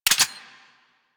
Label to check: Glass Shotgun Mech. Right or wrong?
Right